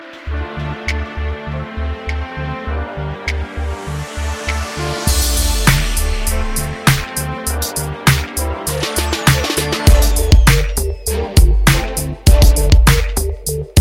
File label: New Beats